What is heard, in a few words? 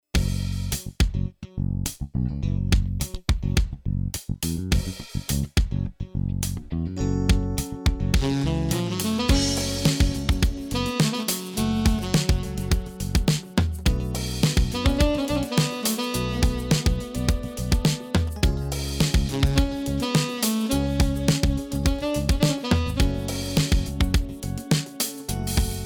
16 beat